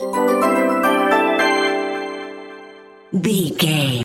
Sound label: point sticker bonus score reward jingle